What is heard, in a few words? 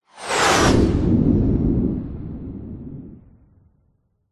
vehicles plane fighter jet zoom by very fast designed